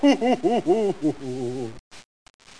laugh

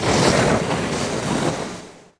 Skid; SuperBike2001

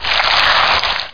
open; Hexen2; hydra

